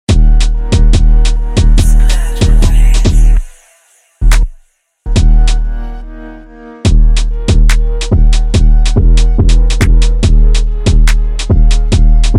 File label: Instrumental